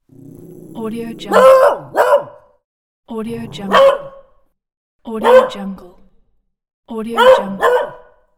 dog bark

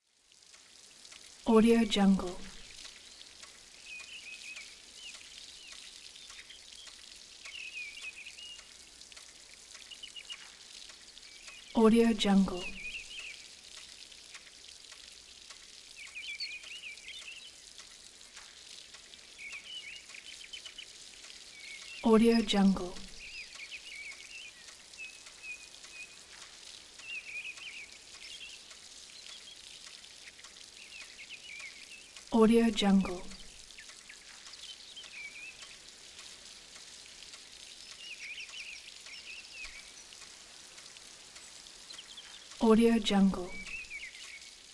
garden with water sprinkler; timmarket

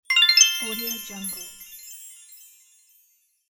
diamond sparkle

